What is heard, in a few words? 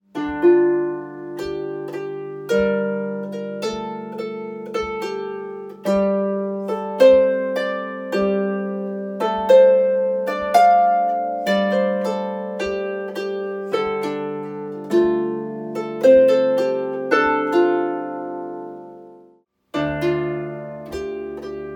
Gaelic Airs, harp; 4 Gaelic Airs